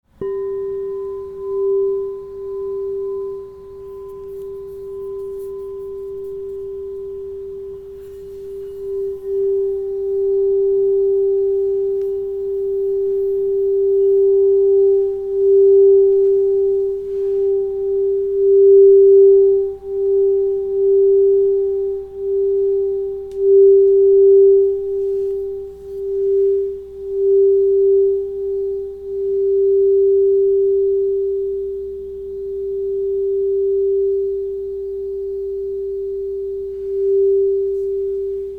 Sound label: Crystal Tones Singing Bowls